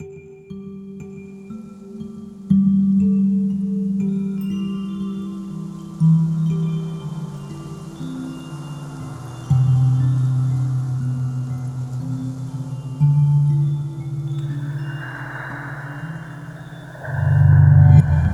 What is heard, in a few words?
Solo